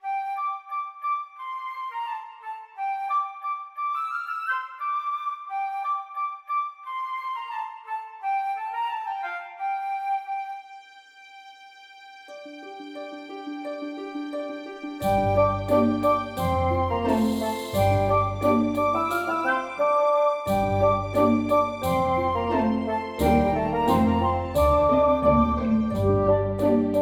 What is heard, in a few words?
Pirate; The Guild 2